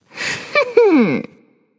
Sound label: hehheh; smite; voicepack; scylla